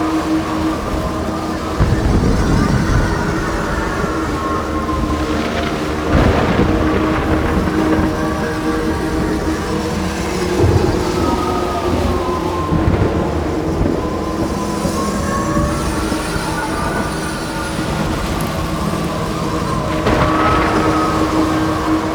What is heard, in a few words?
Hand Portal Loop; Ambience; Props; Warframe; Duviri